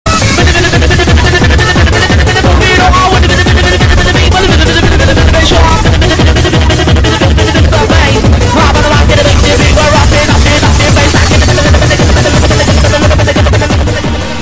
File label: funny music